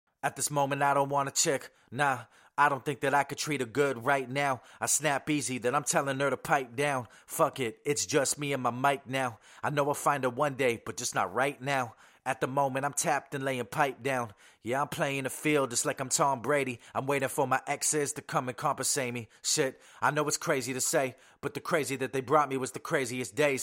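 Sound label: hip hop 100 C sharp minor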